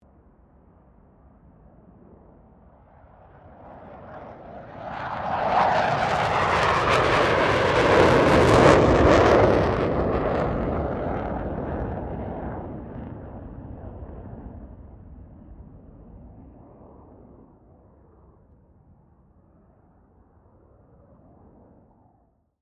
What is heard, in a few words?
AIRCRAFT FA-18 HORNET PASS